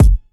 bumpin kick drum F# key

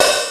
clear open hat d key

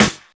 airy acoustic snare sound a key

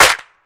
clap c key; percussion; single hits one shots

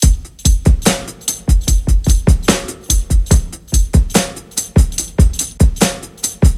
73 bpm drum loop sample g sharp key

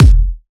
kickdrum sample F key; kick drum samples

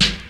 dry steel snare drum g sharp key; snare drum samples

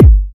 clean kick g key; single-hits-one-shots

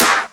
studio snare one shot a sharp key

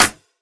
original clap single hit b key; single hits one shots

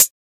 original pedal hi hat single hit g sharp key

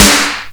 snare sample G key

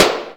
snappy steel snare drum sound a key

